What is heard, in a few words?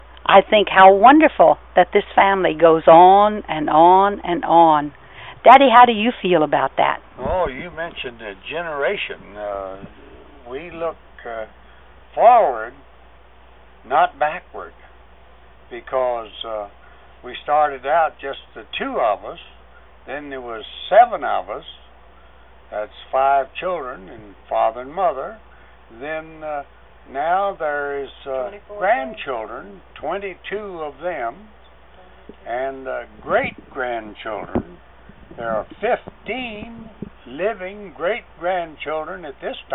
flutes